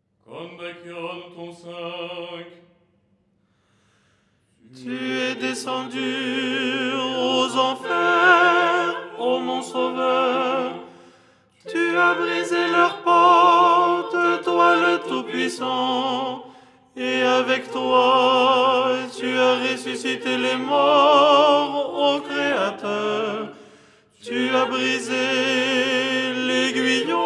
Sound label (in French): tenor; Kondakion du dimanche; Les 8 tons en français